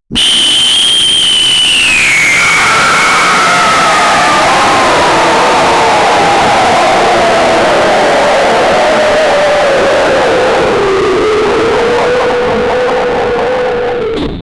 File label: a sound of a monster, distortion